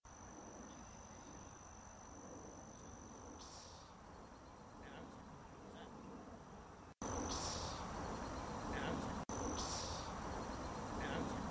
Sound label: Whoosh